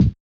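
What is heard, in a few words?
Bass Drum Break; Yamaha DJX-2B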